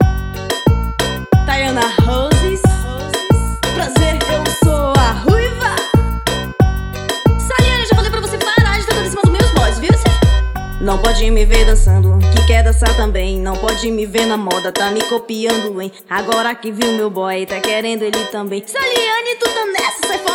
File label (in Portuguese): brega funk